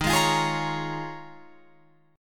Eb 7th Suspended 2nd Suspended 4th Eb, Guitar Open F; strummed; 15,15,17,15,16,17